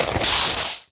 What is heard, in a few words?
Bodyfall Gravel; SFX Kit; Bodyfalls